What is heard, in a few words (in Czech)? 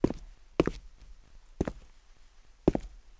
kroky; Mafie 1